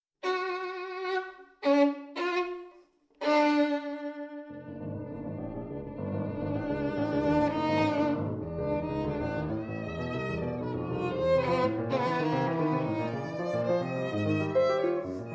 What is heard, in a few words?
violino sonata